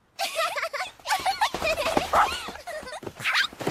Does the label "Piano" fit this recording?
No